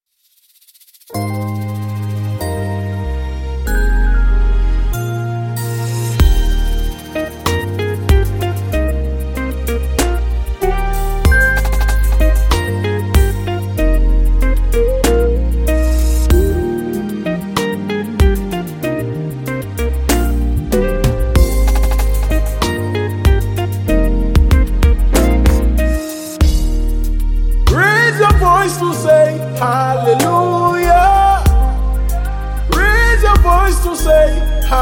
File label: Sax